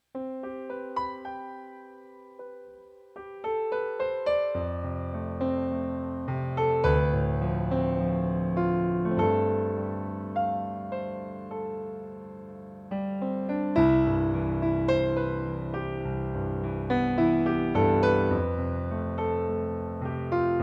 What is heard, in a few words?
Kawai MP11 Concert Grand